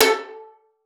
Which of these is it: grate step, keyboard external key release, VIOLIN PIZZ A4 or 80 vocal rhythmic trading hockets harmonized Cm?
VIOLIN PIZZ A4